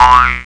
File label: boing